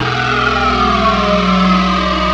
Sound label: Industrial Note Long, Keyboards